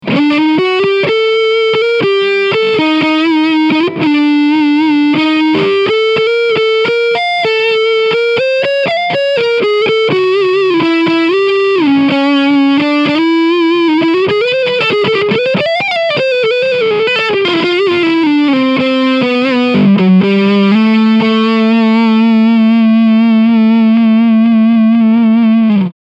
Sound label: Leadsound Front PU